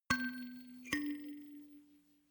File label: notification kalimba rattle third up